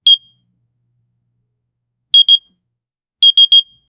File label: sound of a credit card, transaction